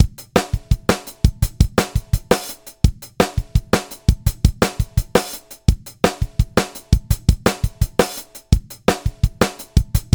HOFA IQ Series Limiter V2 Beat hard 8x 9db

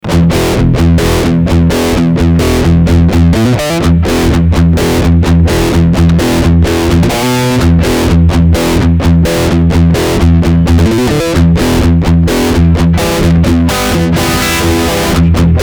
Line Out Sound

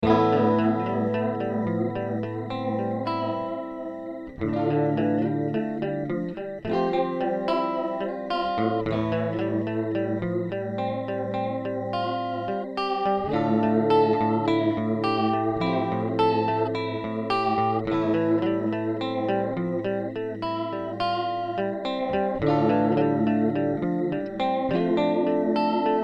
Fender Bubbler Chorus

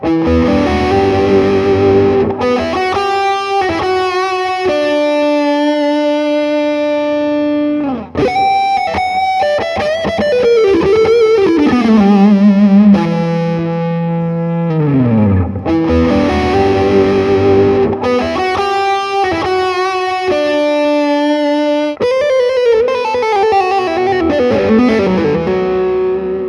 FW65 112 Lead

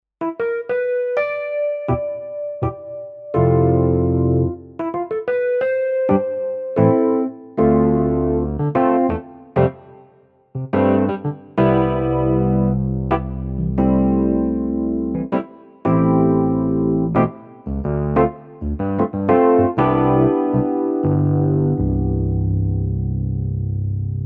E-Piano; Kurzweil KaE1

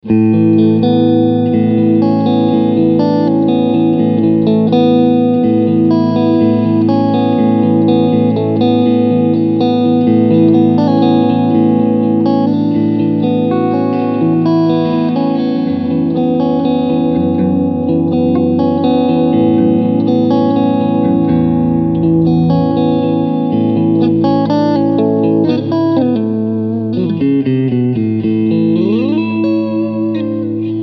Clean Front Middle

